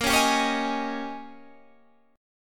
Ab Major 7th Suspended 2nd Suspended 4th Bb, Guitar